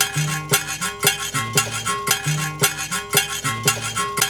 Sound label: HARP SHAKE, HARP+SHAKER; Zero G - Ethnic